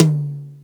subtle reverb tom single hit D key, tom drum tuned to the D note